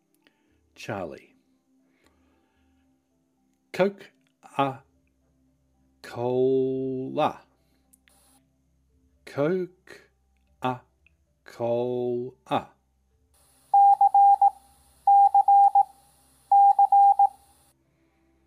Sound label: Morse C